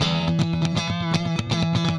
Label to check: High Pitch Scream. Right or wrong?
Wrong